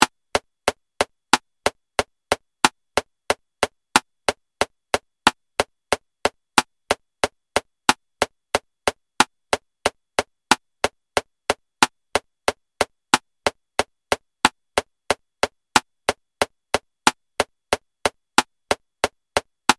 click track; Rhythm of the Gods; xbox series xs

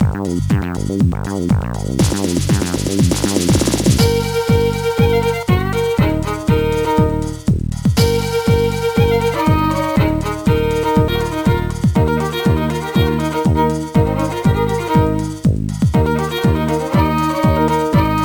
Soul Music

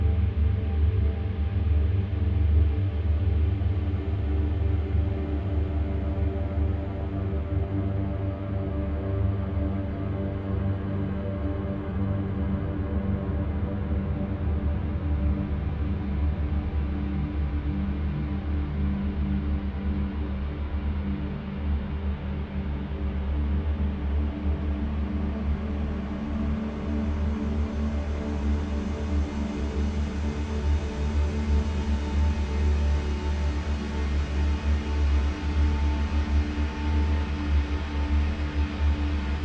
Ambience Powerful

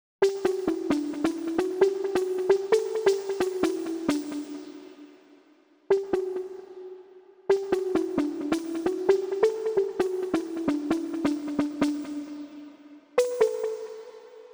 Poppin Piano

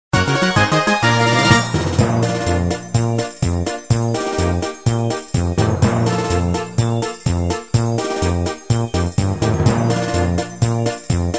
Level Cleared; Angry Duck Cannon 1.5

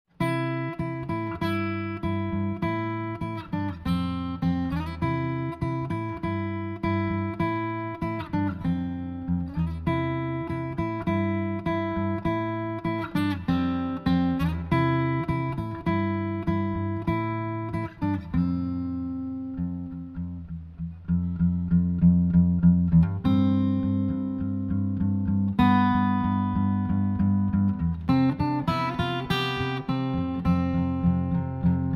Acoustic Theme; Gamma Bros

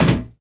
Character Footsteps; Unreal Development Kit

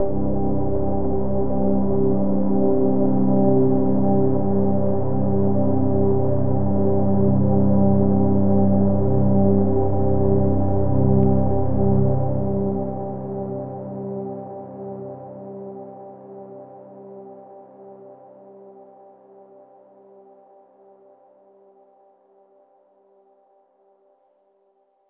Downtown Overlay Drone

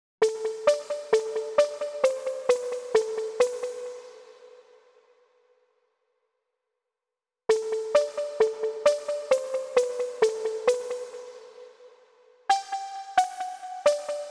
Poppin Piano

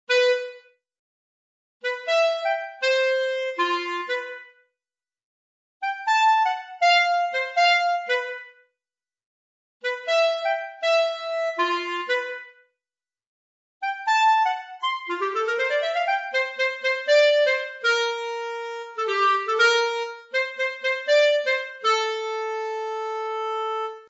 Clarinet

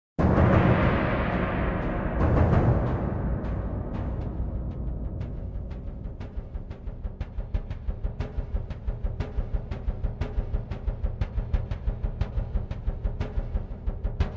Ambient

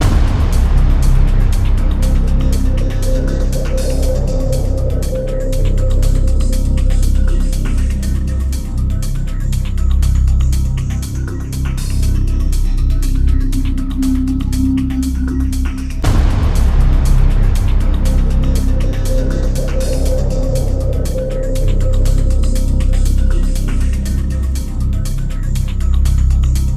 Rival Bed (Loop); Burnout Paradise; PS3

